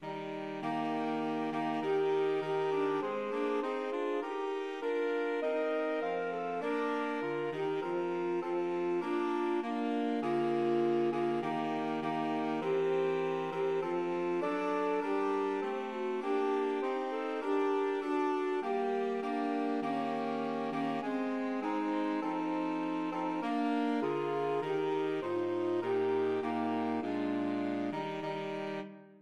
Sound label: navy hymn SAX quartet